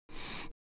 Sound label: br Medium, BreathWav; VOCALOID6 AI Megpoid SOLID Voicebank; Vocaloid 6.5.1